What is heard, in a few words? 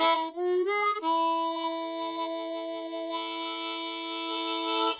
harp; Verdigris Music